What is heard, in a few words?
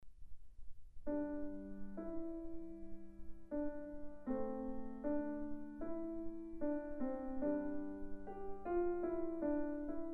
Titelouze Veni Creator piano